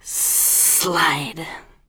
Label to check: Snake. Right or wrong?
Right